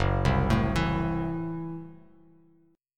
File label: F sharp Minor 7th Flat 5th F sharp Bass Standard; arpeggiated; JGuitar